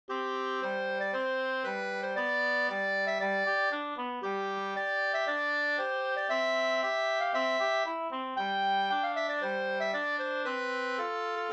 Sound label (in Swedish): ganglat; Gånglåt efter August Strömberg, Jät, Småland; med andrastämma av Hj. Torell